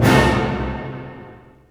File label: Orch Hit Dim; Roland M-OC1